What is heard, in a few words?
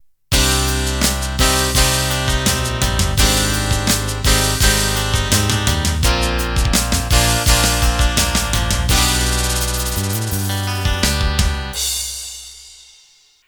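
Rock Ballad Intro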